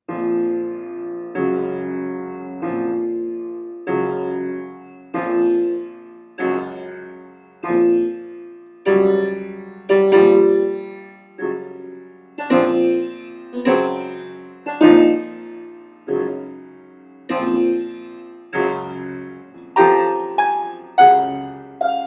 improv Bb C min, piano improvs; Funeral march; B♭ and C minor; Funeral march for Charles Ives, who died on this day in 1954

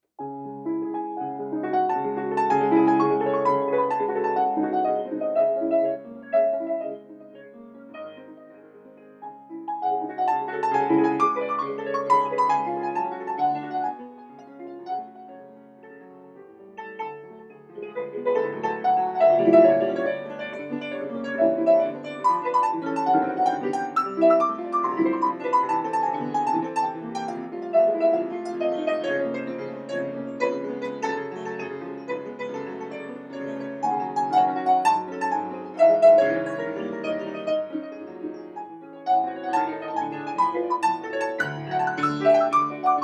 improv C# min, piano improvs; C♯ minor; Anxiety (prelude à la Chopin; Noam D. Elkies piano improvisations

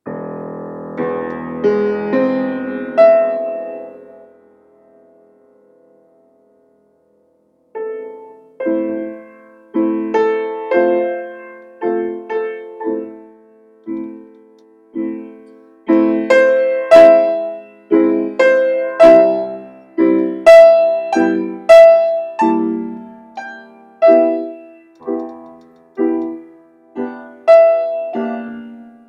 piano improv A min; the blessing for lighting Shabbat candles; A minor

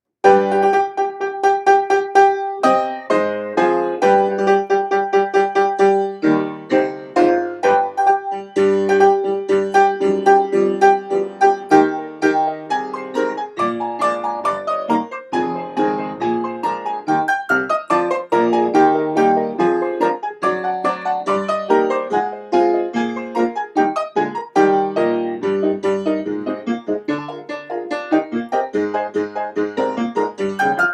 improv C min, piano improvs; C minor; Offenbach travesty (C minor, 1:45); Noam D. Elkies piano improvisations

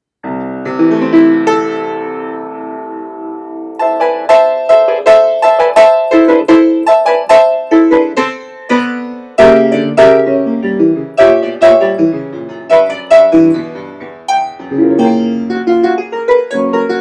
improv E min, piano; E minor; Brandenburg cadenza, on the 300th anniversary of; the dedication of the Brandenburg Concertos

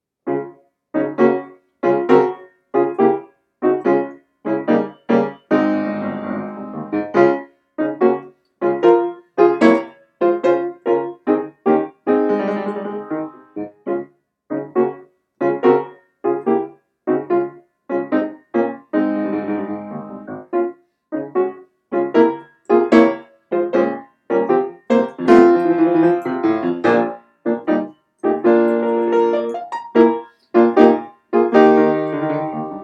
piano improvs, Db maj; D♭ major; Excitement (D♭ major, 1:30); Noam D. Elkies piano improvisations

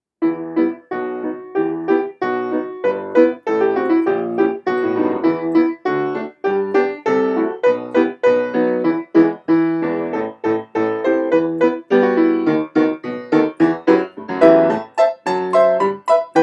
improv E min, piano improvs; tune by Avraham Zvi Idelsohn; Noam D. Elkies piano improvisations

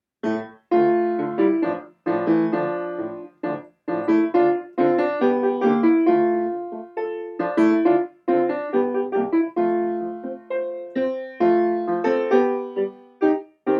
improv D min, piano improvs; Bialik (D minor; Noam D. Elkies piano improvisations

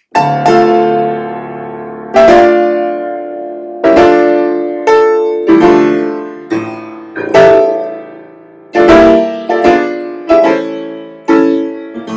piano improv D min; D minor; Let it snow — somewhere else!; On the occasion of the season’s first snow

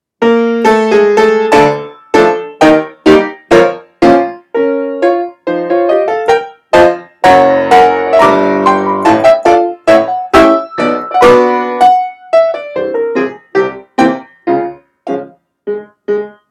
improv D min, piano improvs; Memorial medley; D minor; Sergei Prokofiev; Thomas Arne; Noam D. Elkies piano improvisations

